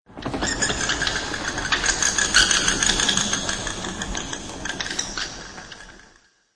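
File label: squeak of a flock of bats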